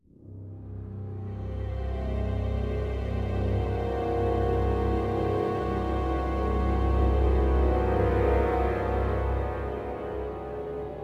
Riser; N.O.V.A. 3 Near Orbit Vanguard Alliance

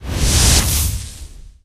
Buildup Short; friday the 13th killer puzzle

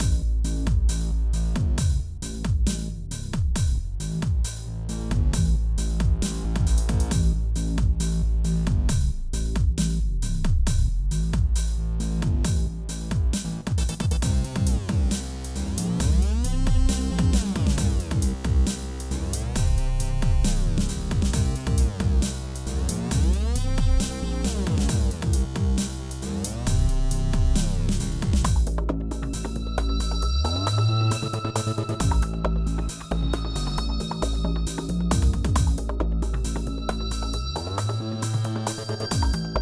Electronic Layer; Chroma Shift

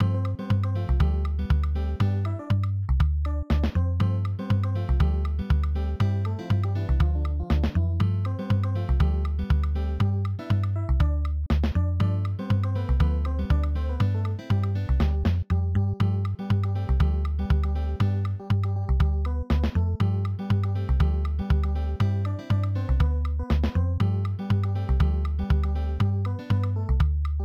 Underwater BGM; Leapster 2; Creativity Castle

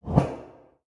whoosh grainy

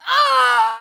Female Scream; soundbits

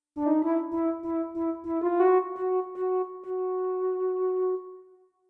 flute

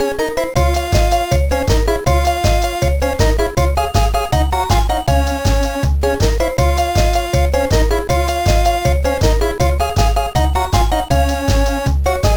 BGM; Denki Blocks; GBA

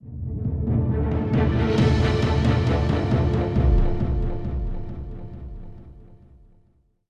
Action Street; The Amazing Spider-Man 2

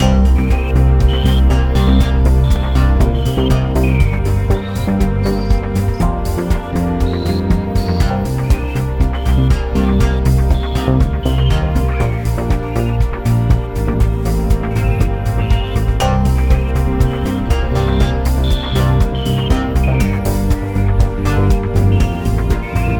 Tense; Everspace 2

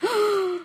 Scared Female

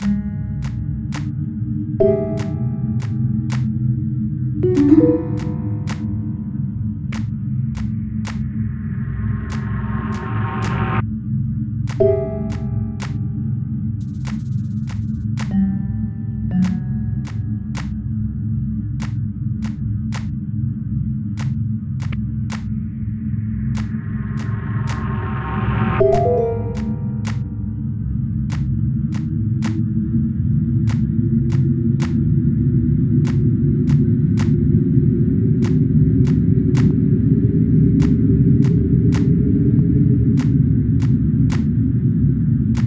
Sarcastic Applause; Jimmy and the Pulsating Mass; From The Darkness

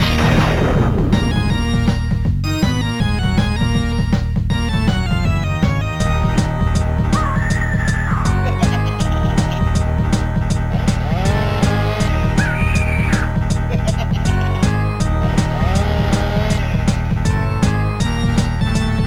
Scary Speedway; Cartoon Network Speedway; GBA

